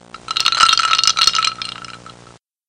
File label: Ice Cubes Sound